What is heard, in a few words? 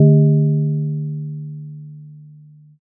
gong